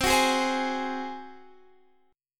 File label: Ab 7th C